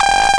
superharsh, drums single hits; Weird and Interesting Hits

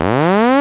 launch; starcrus

